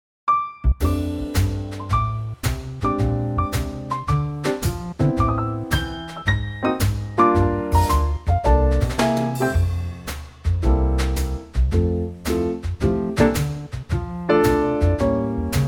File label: F trio